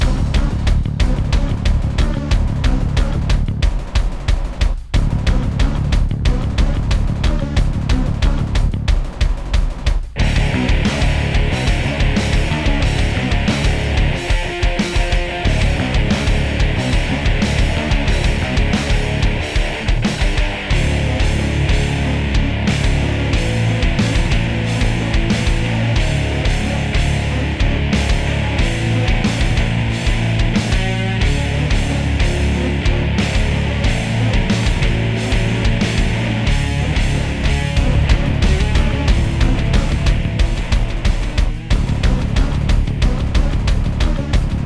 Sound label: Forest Fighting Loop; bright memory mobile